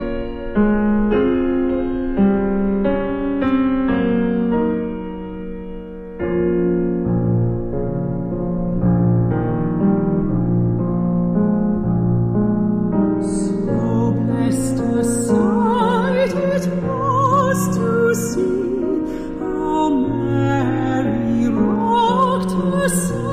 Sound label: piano; English Folk Song- So Blest A Sight; Christmas